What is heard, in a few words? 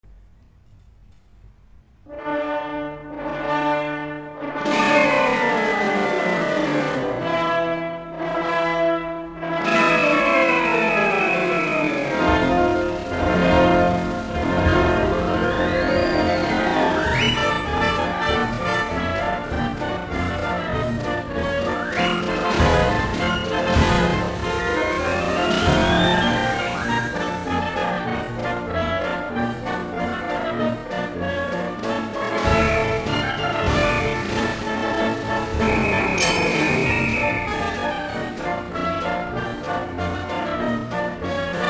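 Circus March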